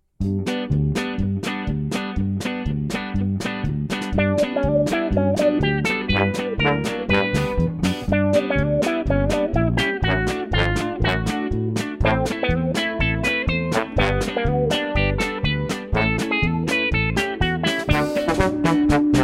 Sportivnaya instrumentalnaya

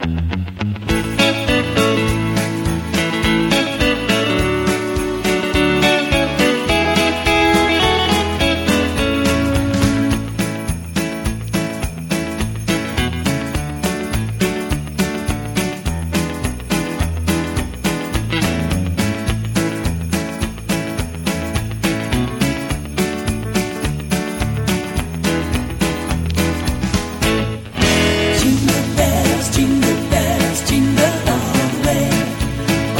Jingle Bells dlya karaoke

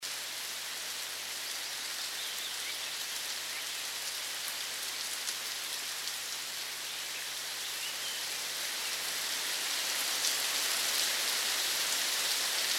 dozhd v lesu

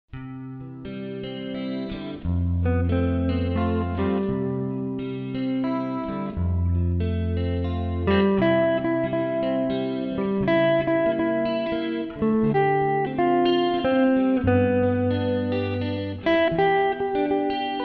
gitara

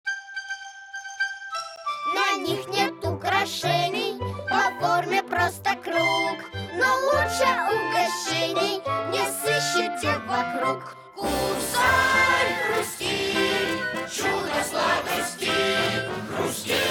Pesnya

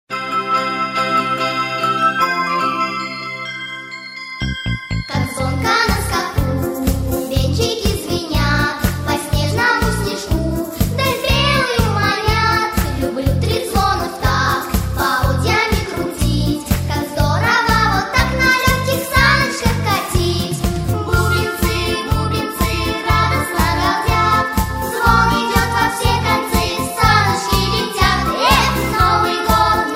detskij hor; pesni pro bubentsy

